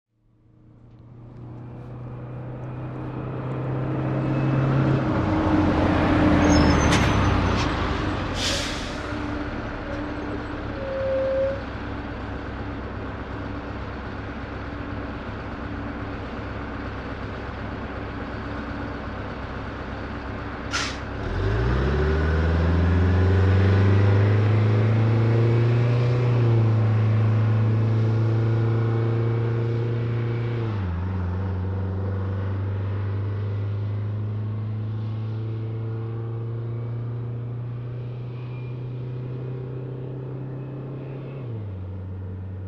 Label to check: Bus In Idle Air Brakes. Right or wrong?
Right